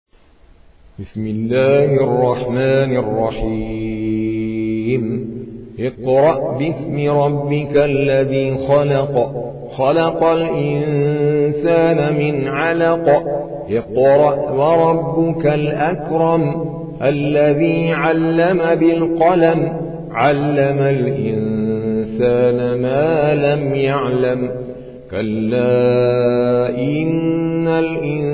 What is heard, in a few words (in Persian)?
ترتیل قاری هندی; سوره علق